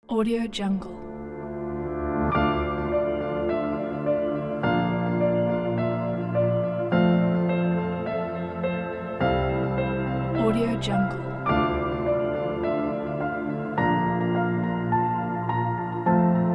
the cinematic piano

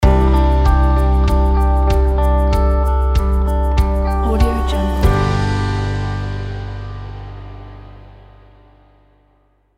romantic acoustic folk logo

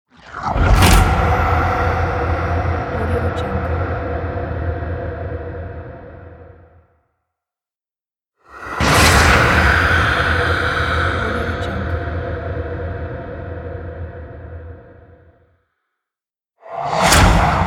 creepy transition horror; rawaudiolab

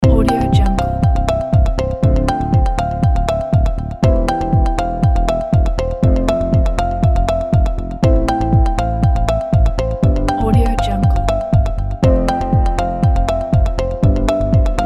business news

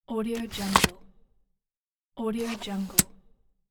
suitcase handle

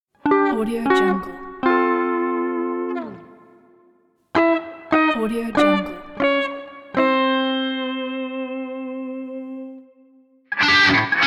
guitar fail sounds

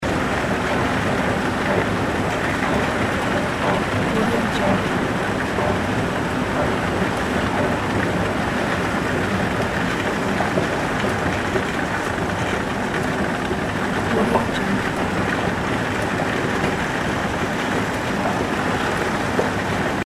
airport escalator

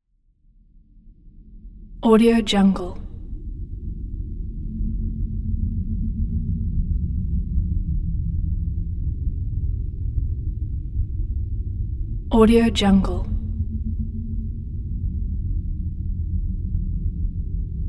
dark drone undertone

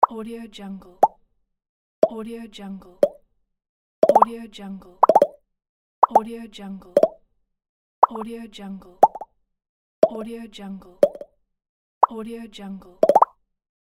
pops bubbles ringtone popping pack

